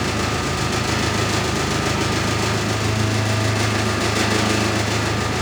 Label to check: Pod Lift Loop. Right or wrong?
Right